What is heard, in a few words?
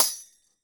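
Tambourine Head; Sonic Foundry (Sony Creative Software); Joe Vitale Latin Percussion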